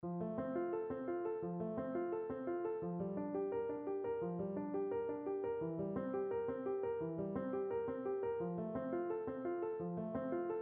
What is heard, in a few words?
vocal duet; gounod ave maria; SOP TEN